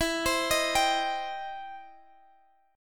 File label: E Minor Major 7th Sharp 5th Guitar Drop D; x,x,14,17,16,15; down